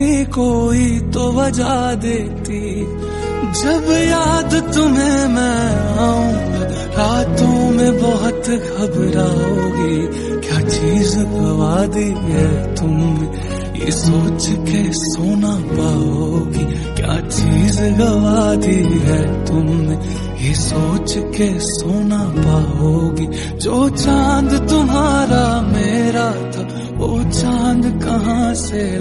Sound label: sad bollywood song ringtone